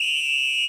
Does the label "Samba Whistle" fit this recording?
Yes